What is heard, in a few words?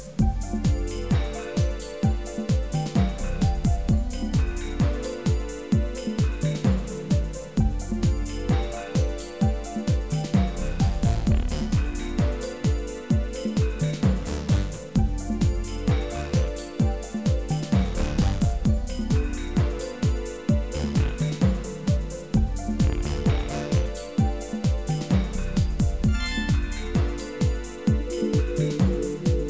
trance